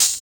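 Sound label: GRITTY closed hi-hat; Drums In Your FACE!; BONUS HATS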